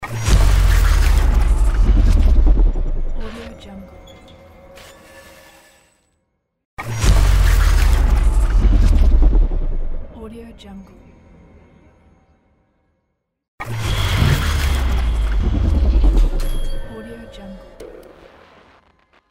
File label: glitch transformation logo; bbrealsound